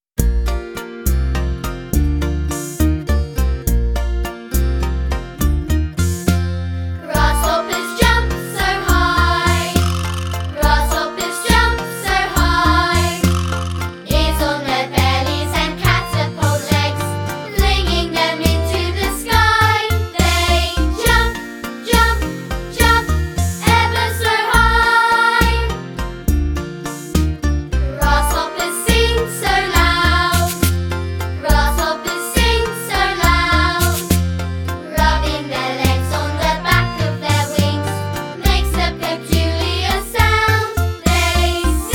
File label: Vocal